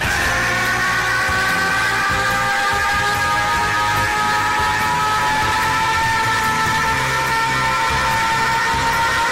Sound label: yelling; goku